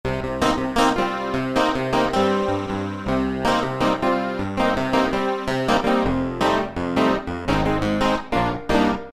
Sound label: Stereo Clav, Roland PN-D50-04